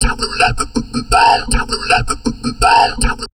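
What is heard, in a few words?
talk, drum loops crazy processed, 160 bpm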